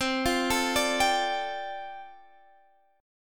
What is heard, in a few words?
D Minor 11th C